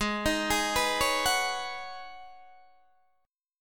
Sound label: G sharp Minor 11th G sharp Guitar DADGAD, chord